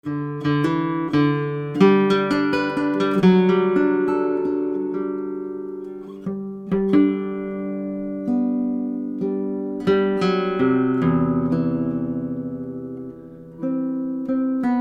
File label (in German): Happy Birthday Gitarre